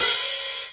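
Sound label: bend up open hi-hat; Hats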